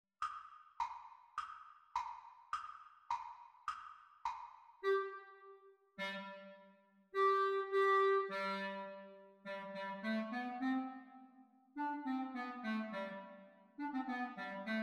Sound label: Exam Speed Recording Clarinet Duets; Grade 2; The Teacher part